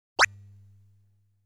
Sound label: Bullet Scales Launch Phase; Dragon Breed